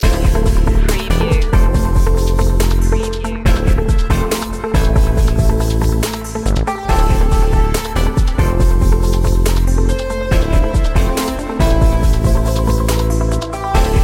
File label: urban groove main loop